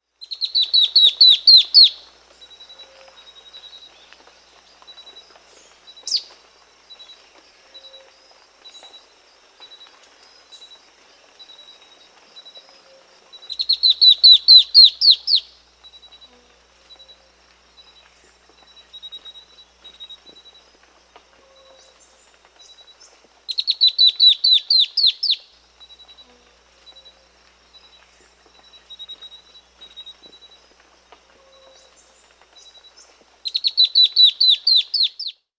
Black-throated Antbird (Myrmeciza atrothorax), Bolivia; Birds of the Amazon; S. America